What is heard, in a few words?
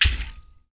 HAND, Afro Shakers, Ankle Pods; Roland LCDP14 Africa VOL-2